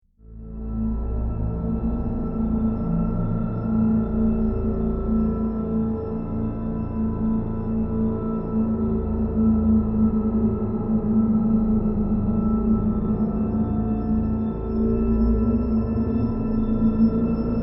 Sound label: Drone Scifi Horror Tonal Trailer Open Ominous Ambience; Sound Design; Trailers